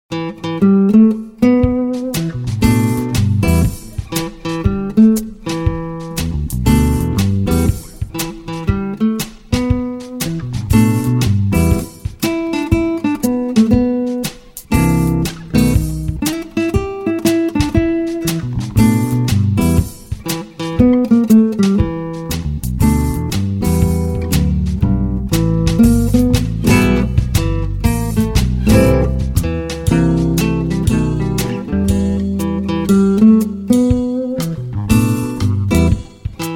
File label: Guitar worksong